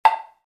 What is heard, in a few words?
temple block set; black swamp temple block set 5; log drums temple blocks